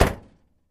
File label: Camaro Door Close, chevy camaro